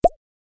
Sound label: message send